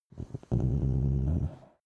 dog small growl very soft; natur-tiere; hunde

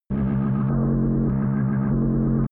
low abstract synth; energie

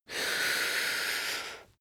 male breath slow in; menschen; atmen; soundpool

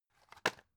drug package drop deep; arzt

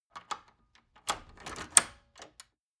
wood door old lock turn key

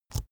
keyboard laptop enter push release

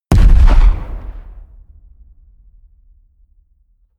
explosion fuel; explosionen; comedy-cartoon; soundpool

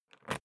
briefcase plastic latch lock key pull out ringing

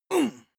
male combat hit soft; schreie; menschen; soundpool

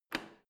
washing machine creaky button press in; haus garten; haushalt

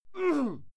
male fight yell muted

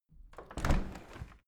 window handle open soft; objekte; fenster

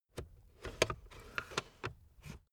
car backseat table fold in slow

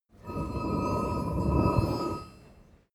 metal scrape

